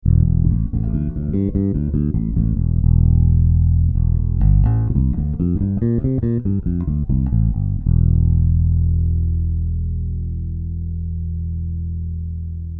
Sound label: Bass; MONSTER